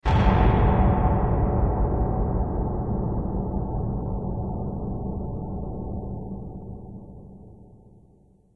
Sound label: amb random abstract; video games; ambient; source engine; portal2